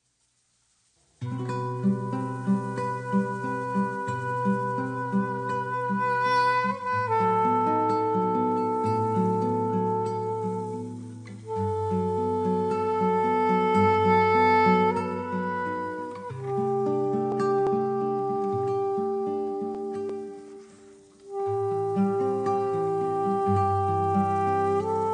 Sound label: jazz, bossa nova; sampler bossa nova